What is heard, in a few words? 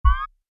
Menu SFX: Navigate, Button; Buttons (Sound Effects:Multimedia); Menu Move; Stuart Duffield